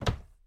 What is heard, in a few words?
Close Tumb; Game rip; MiSide